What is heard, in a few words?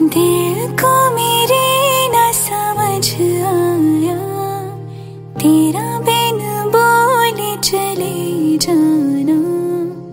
Female Version